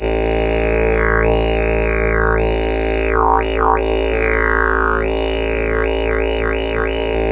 A drone simple, Didgeridoo; Big Fish Audio; Didgeridoo and Other Primitive Instruments

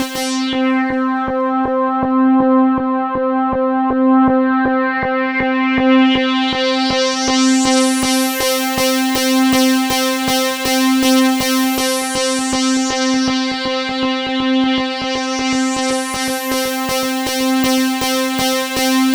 C4, SynthLine; 90 s Sample CDs; NiceBeats; SynthLine VOL-1

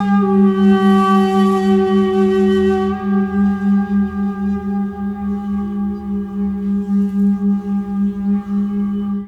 Ambient FX, Ambience FX

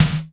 SYN SNARE; Q Up Arts; Dream Experience

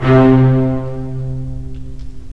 STR, Full Short; Roland - LA Composer vol. 4

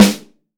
P-SN, PICCOLO SN

